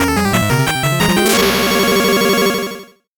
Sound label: 8bit jingle normal